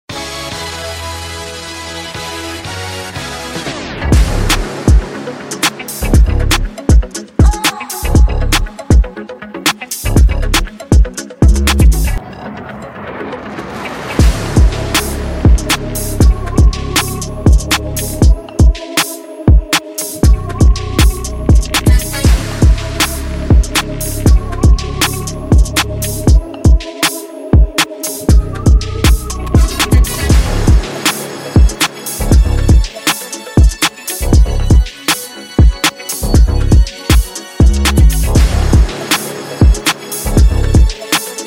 Type Beat Instrumental

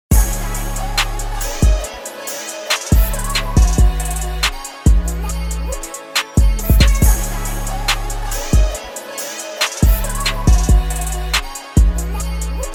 Instrumental

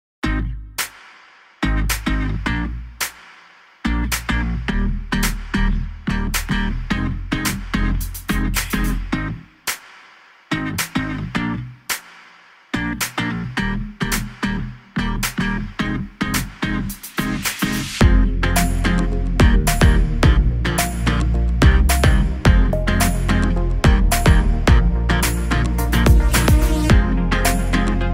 instrumental

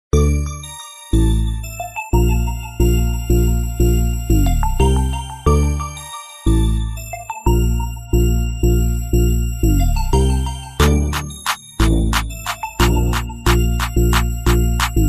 instrumental

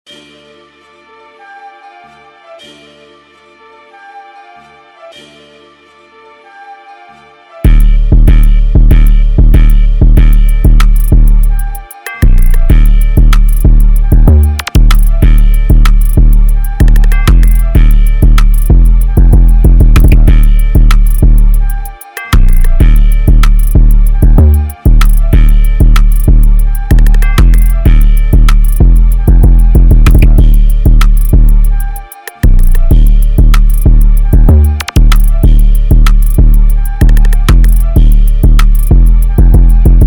Instrumental

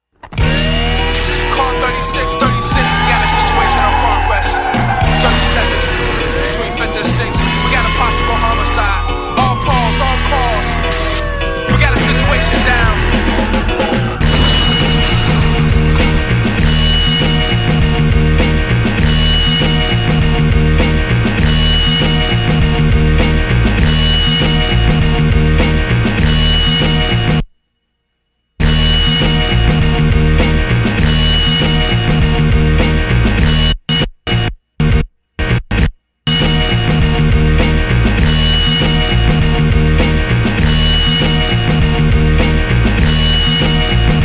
instrumental

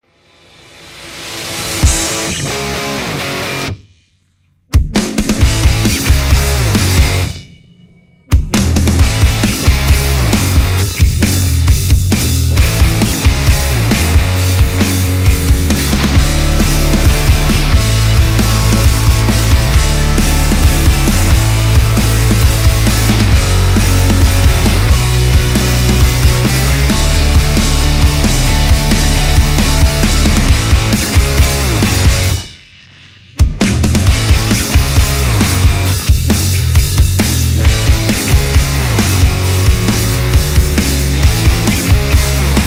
Instrumental

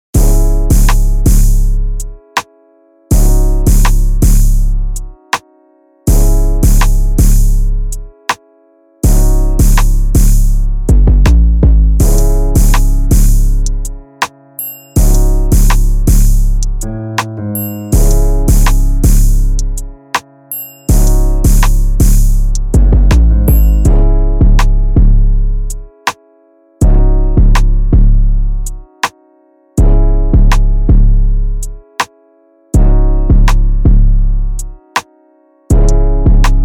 Instrumental

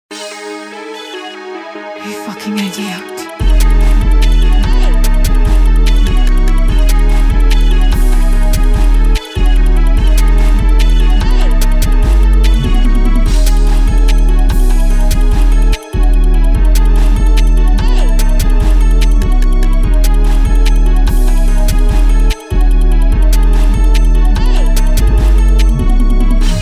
Instrumental

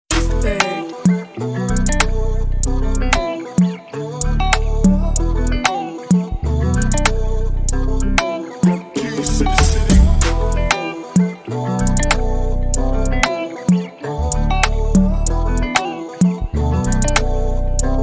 Instrumental

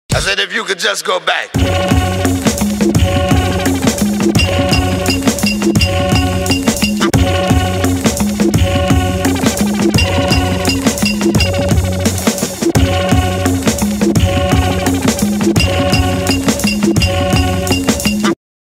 Instrumental

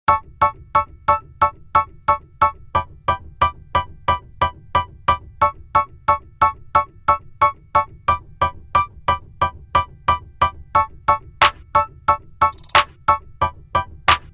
Instrumental

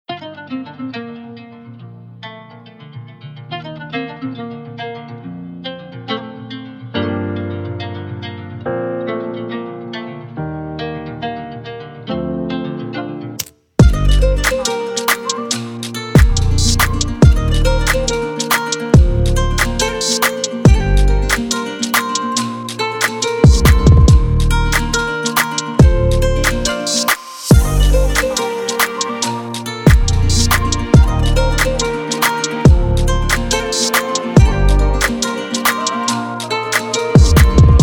Instrumental

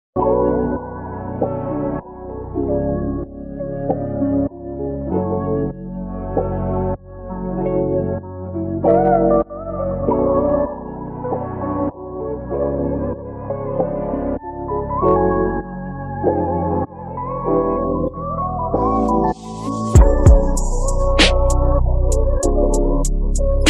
instrumental

